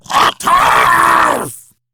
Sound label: Attack, Battle Barks